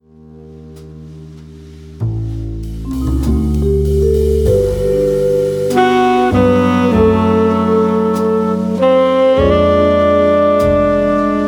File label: Jazz